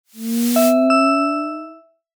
logo luxury single glint

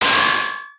nature water splash medium

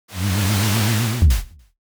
horror floorboard warning

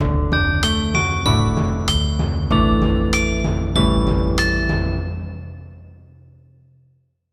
jingle stream start

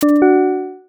complete sparkle

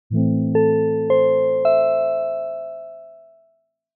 logo night channel

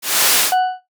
lower third draw

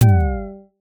metal punch cut

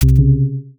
low battery joke

